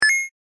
ui mini get

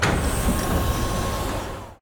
battle ship move

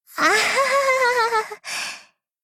laugh

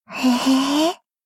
laugh

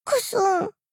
cry

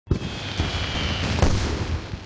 firework

